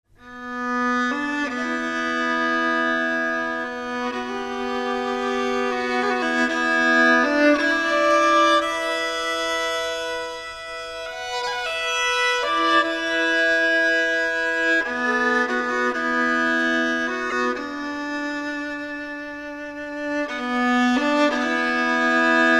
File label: Hardanger, fiddle; gunnar helland